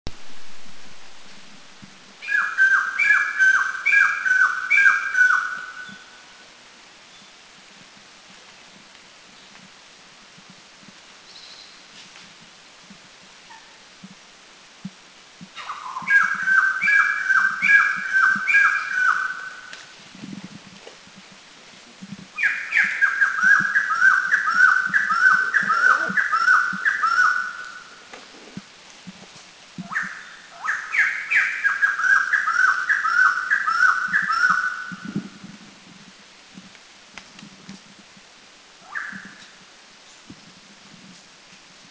White-crested, Laos; White-crested Laughingthrush

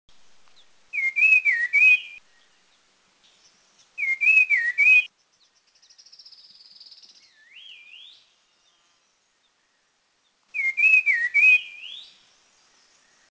Cachar Wedge-billed Wren Babbler Song; Mishmi; India

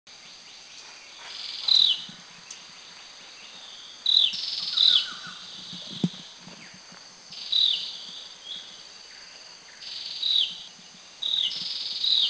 Dark-necked Tailorbird; Orthotomus atrogularis; Thailand; Krung Ching

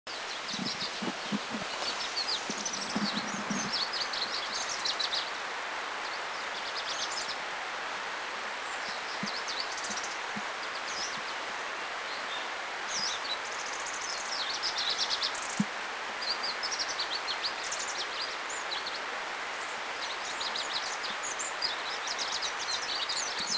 Pallas Leaf Warbler Song, China

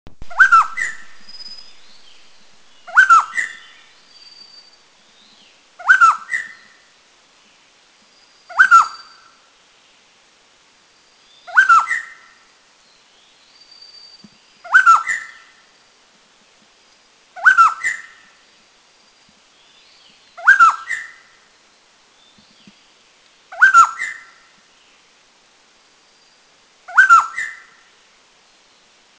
Spot-breasted Scimitar Babbler, Taiwan

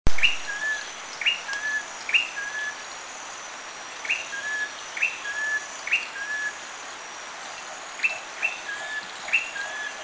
Rufous-tailed Tailorbird

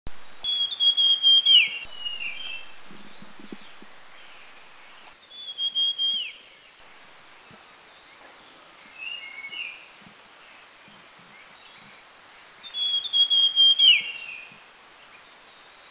Beautiful Sibia, India, Dirang

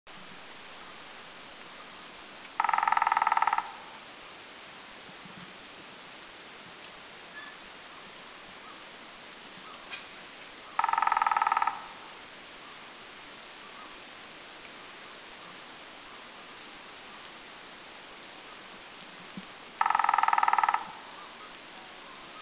Crimson-breasted Woodpecker Drum, Doi Lang; Thailand

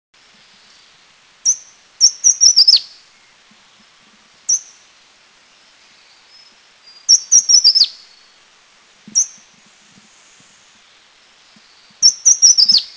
Chestnut-headed Tesia Call with Intro Note; Eaglenest; India

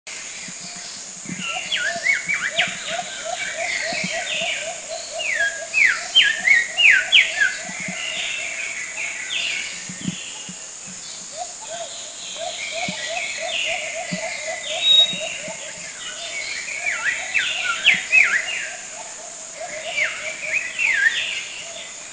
White-vented Whistler, Philippines

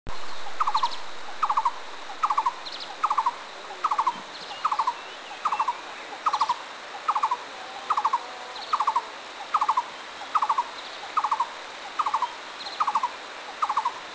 Blue-throated Barbet, Thailand; Doi Inthanon

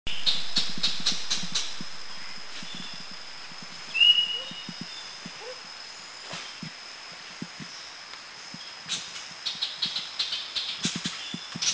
Ochraceous Bulbul; Thailand; Krung Ching

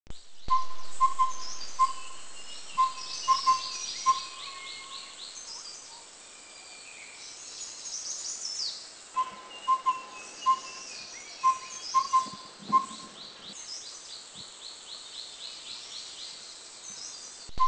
Collared Owlet; Mishmi; India

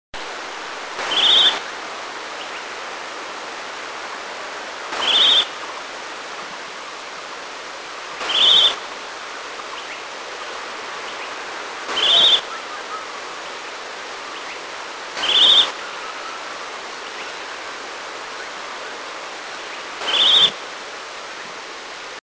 Chestnut-bellied Nuthatch, Eaglenest, India

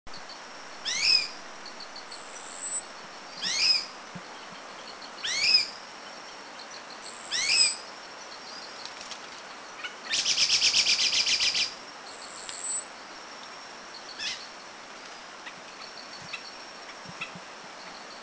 Philippine Bulbul Kitanglad

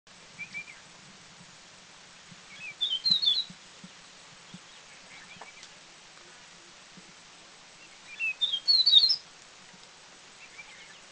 Slender-billed Babbler, India

